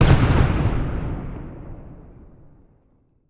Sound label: Explosion Distant Mine; Fire and Explosions